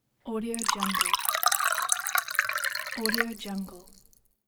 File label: wine glass pour